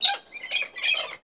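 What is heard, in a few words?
Cockatoo Roseate Chirp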